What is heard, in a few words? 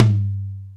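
Lo Dbl, Alesis D4 drum machine tom; 300 Drum Machines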